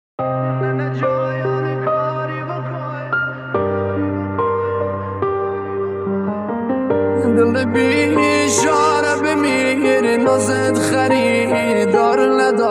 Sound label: Piano Version